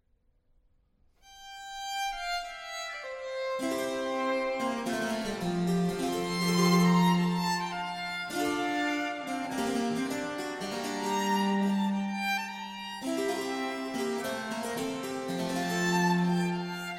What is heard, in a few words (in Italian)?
Sonata a Violino Solo e Basso continuo; Sybrandt van Noordt